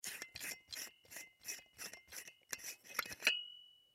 Light Bulb Unscrew, Light Bulb Unscrew Socket Twist; Lights; Household; Foley; Blastwave